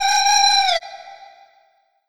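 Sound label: Chinese FLUTE; Soundscan vol.60; Asian Traditions; AKAI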